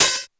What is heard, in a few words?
Roland U220 Electric Set